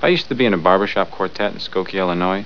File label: quartet